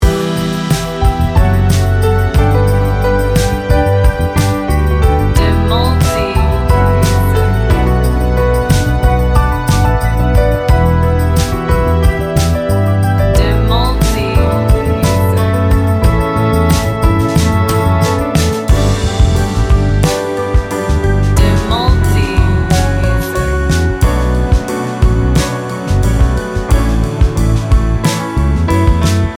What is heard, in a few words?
instrumental pop; Nuansanya melankolis namun tetap hangat